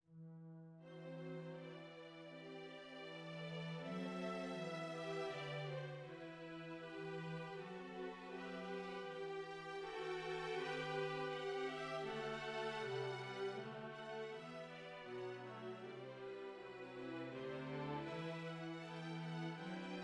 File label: strings